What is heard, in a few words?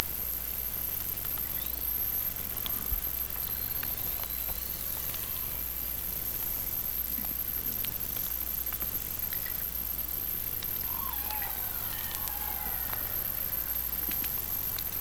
Hydrophones, orcasound